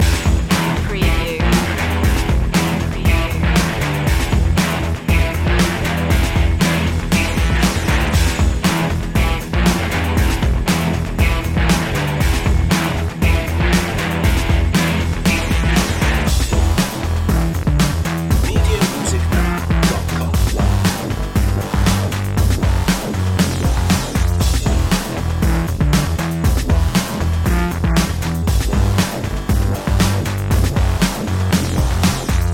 thunder loop

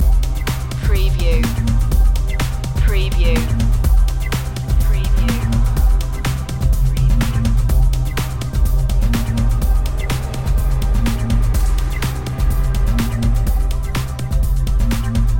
constant flow loop

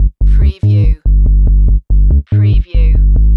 Techno-Trance Sub Bass Loop in G 142 BPM

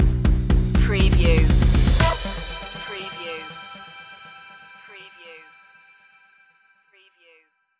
firebirds stinger

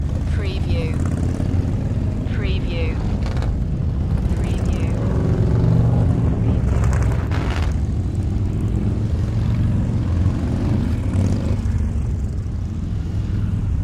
Biker Gang

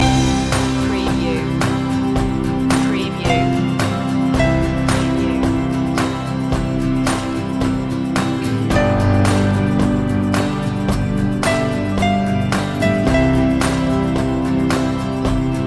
loop

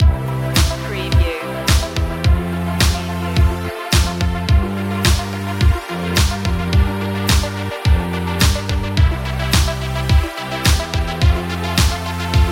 synthwave feeling

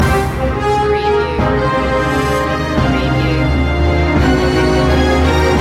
orchestral action loop

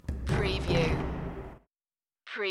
B Ball Hit Rim

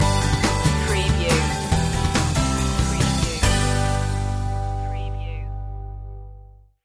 building momentum stinger

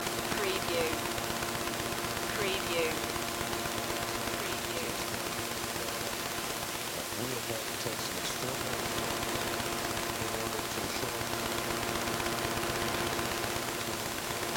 Walkman Static; Sony

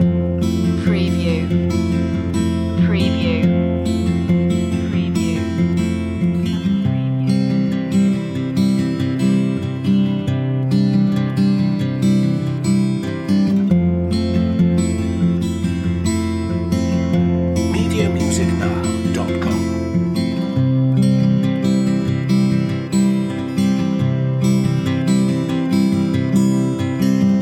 summer rain loop

